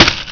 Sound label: Enemy Explode; PowerPete Demo